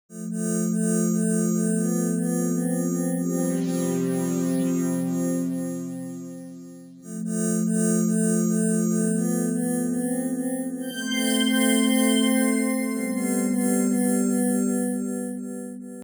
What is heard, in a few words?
padsynth; Battle Theme